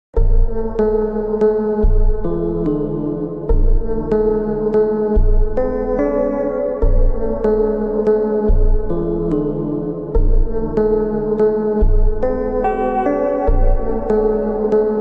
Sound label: slowed reverb